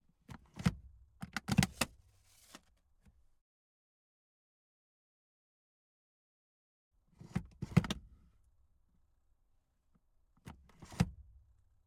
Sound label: volvo fm10 var gear stick sequence